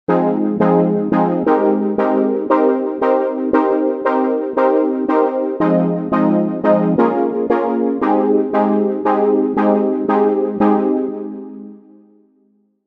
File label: resonance chord progression; midi recipe